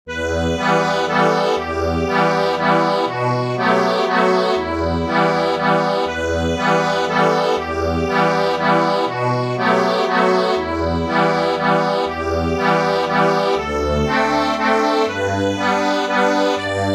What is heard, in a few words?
We three kings acc